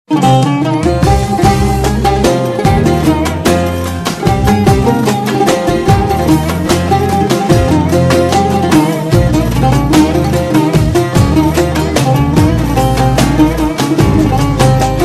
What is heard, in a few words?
Kurdi